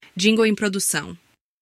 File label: jingle